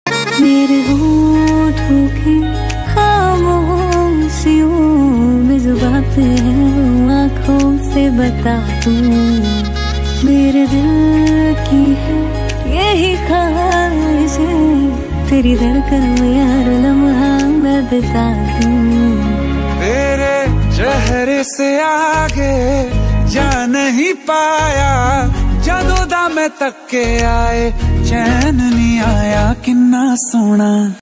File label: female version ringtone